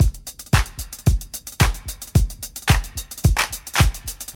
111 bpm fresh dance breakbeat sample A key, Free drum loop - kick tuned to the A note.